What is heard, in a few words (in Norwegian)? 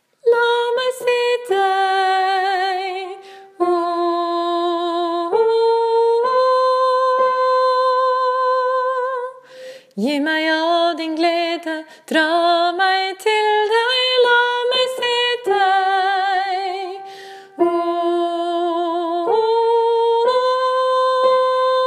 la meg se deg sopran; åfjord